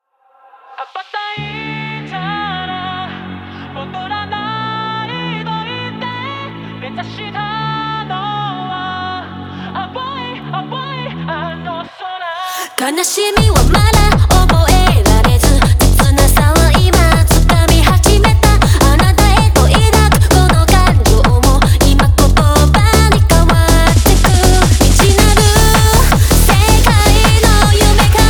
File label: hypertechno